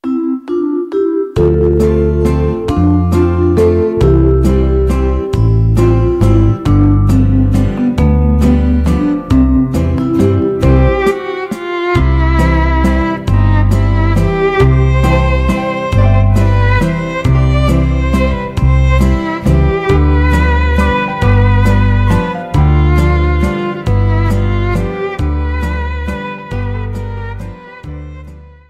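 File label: round dance Instrumental Waltz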